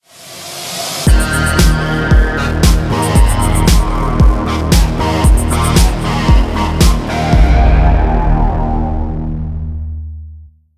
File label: Stinger